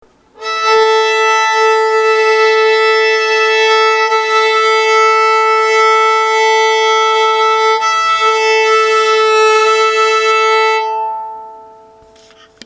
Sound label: A-E doublestop, violin; tuning